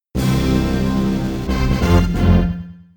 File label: Fight Over; Boogerhenge - Tureen Toilet